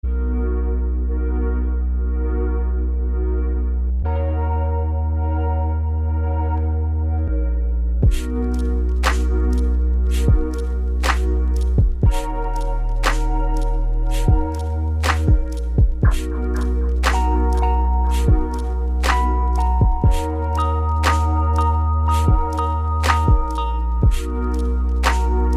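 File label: cemba waves, BEAUTY FLOOD, The Cembalet is a soft sibling of the clavinet from the 50s. We maximized the dreaminess with a layer of granular waves.; CINEMATIQUE; KLANG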